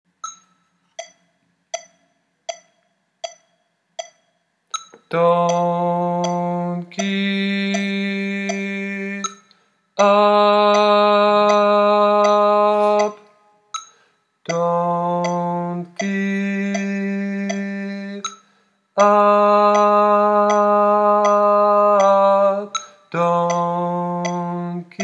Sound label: Chorus Bass oktaviert